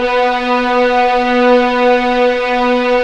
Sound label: B3 LEG P, VIOLINS; East West; The Ultimate String Collection